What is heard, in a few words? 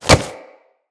Mantle Foley; Characters